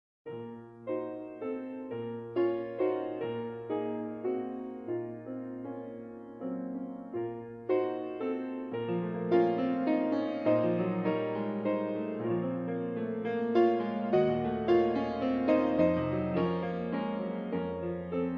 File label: Waltz in Bb minor, Grand Piano